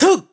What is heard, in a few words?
Roll; Link; zelda sounds; Skyward Sword